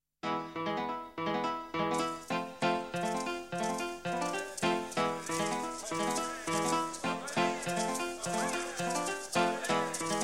Jingle

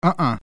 Urhurh

